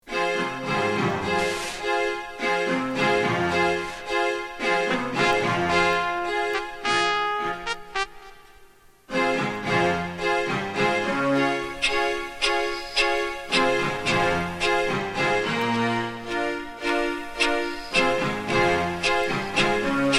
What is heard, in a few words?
Unknown orchestral